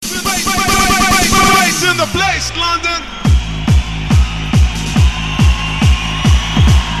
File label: Sms Bass; London